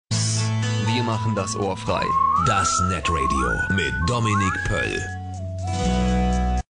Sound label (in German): Jingle; Ohrfrei